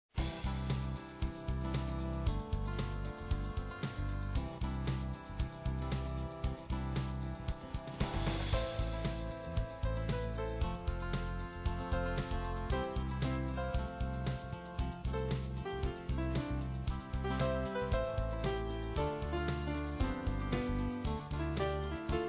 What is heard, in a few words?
Piano Shared Islands